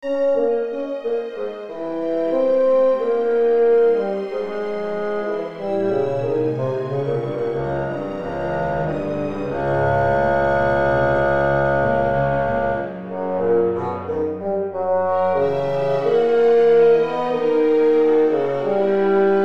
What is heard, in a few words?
Bassoon; Bassoon Concert (2013)